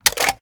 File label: ar reload empty fast mag out; weapons; gmod; viper